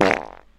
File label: fart short